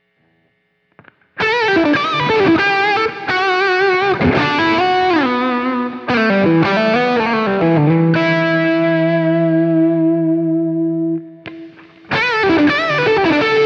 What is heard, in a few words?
thornbucker single note